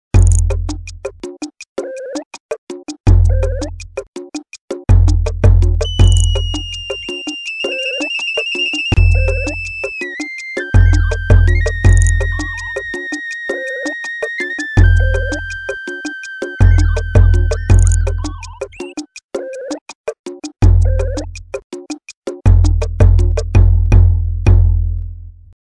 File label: Tribal, Nokia Tones